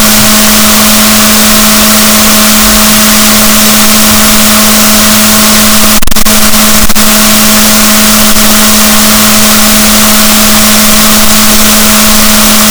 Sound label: rorquals negatif, gaussian noise; Balaenoptera Physalus; SNR 6dB